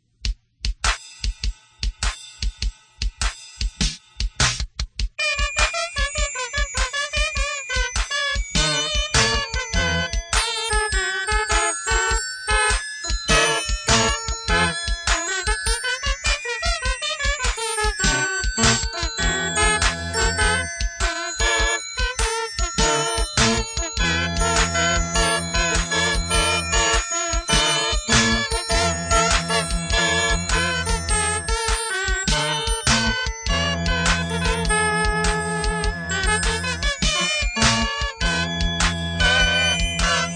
Poor Attempt at Rhythm

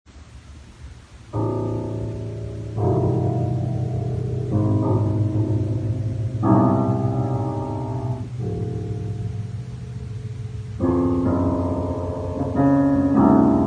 original piano

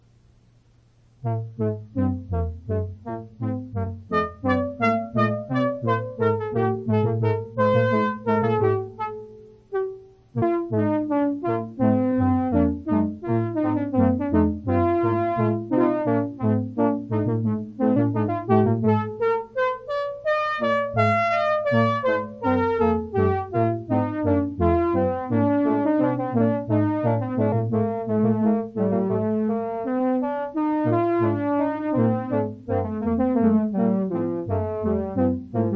Horn allegro

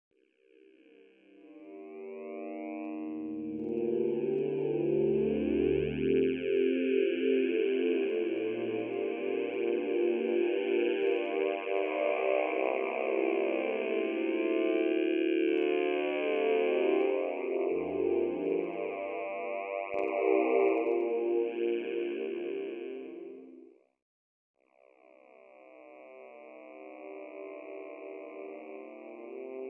E Chant; Droneo

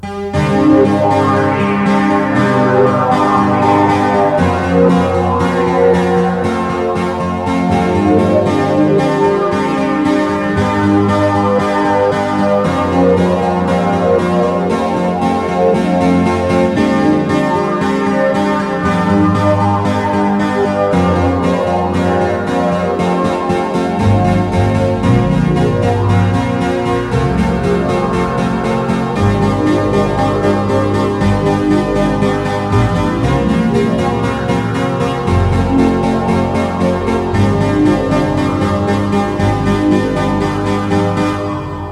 Synthesizer parts

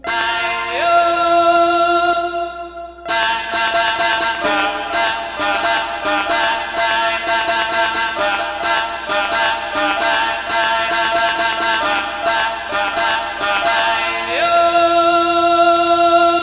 A Guitar and Mirage

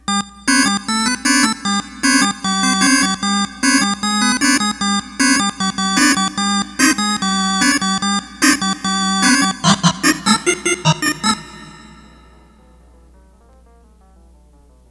Synths

